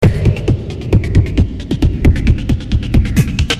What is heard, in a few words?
Thundethud